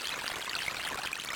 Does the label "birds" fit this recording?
No